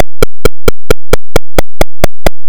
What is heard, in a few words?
walking; Back to Skool